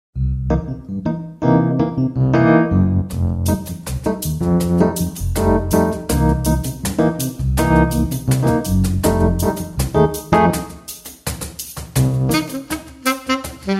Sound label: Funky